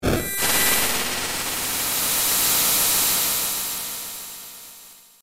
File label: huge spell; ff3; ff3us